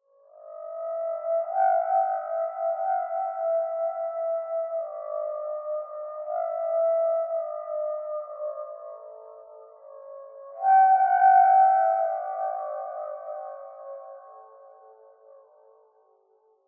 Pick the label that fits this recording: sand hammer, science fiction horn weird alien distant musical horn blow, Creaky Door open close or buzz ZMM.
science fiction horn weird alien distant musical horn blow